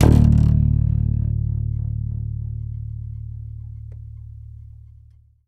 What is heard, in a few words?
SUSFD1, Bass, YAMAHA5 MUTE